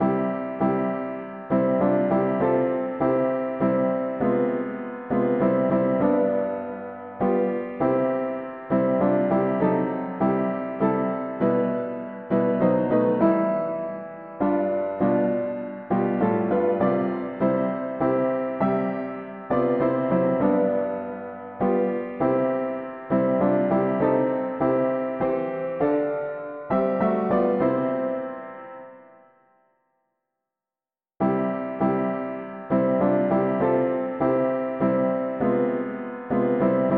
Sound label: mono piano; hymns; O Lord in Thee believing